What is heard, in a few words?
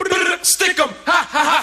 Human Beat Box